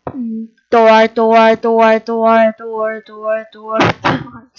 door

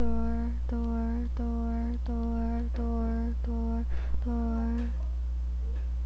door

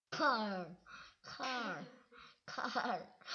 car

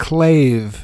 clave

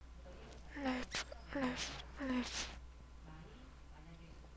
laugh

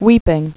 weeping

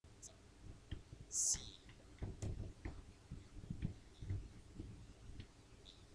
sea

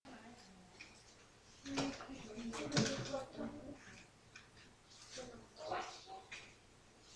flute

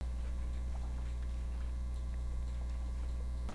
boar

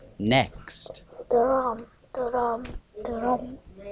drum

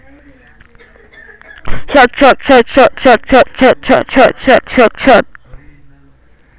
shot

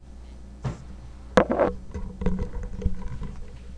crowd

